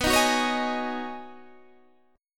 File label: G sharp Minor 11th B, guitar chord